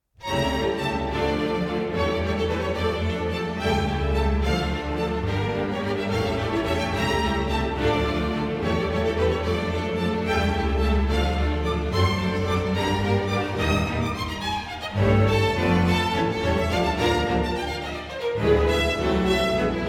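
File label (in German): souvenir de florence für Streichorchester d-moll op 70 allegro; Allegro Con Spirito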